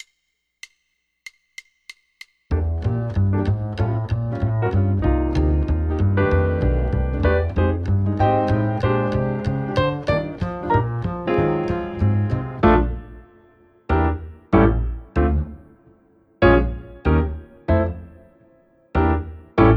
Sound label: Jazz Swing No Drums Trade 8s; LMEA All-State Jazz